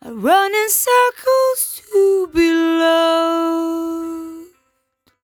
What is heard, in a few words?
57bpm Am, Velvet Vocal; Zero-G